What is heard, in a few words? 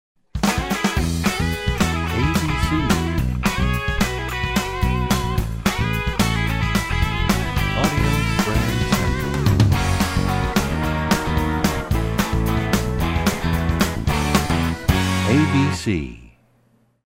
American Country, radio jingles